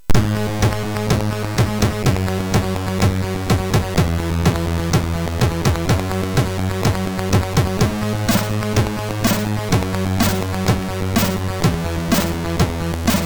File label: MOS6581R3, GAMES; Shellshock